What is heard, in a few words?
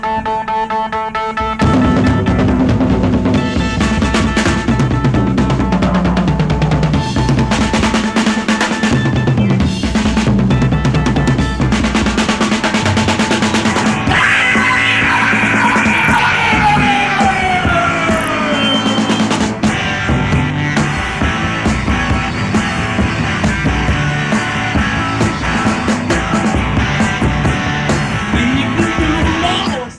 Drum Solo; Live In Houston 1975